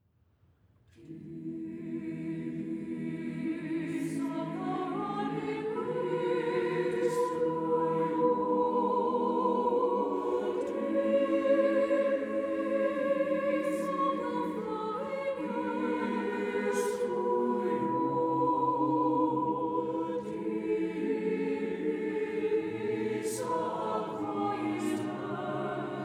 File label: Chamber Singers; University of South Dakota